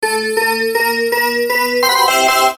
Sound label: freespin add; Dragons Gift; slot